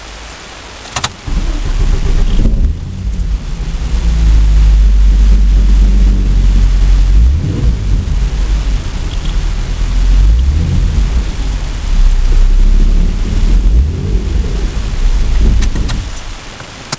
muffler key on startup idle rev key off inside Jeep